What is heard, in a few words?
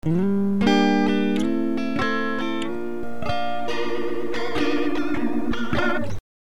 vibrato